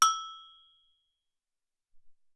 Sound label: Bell Hit, Alesis SR-18